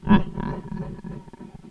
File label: laf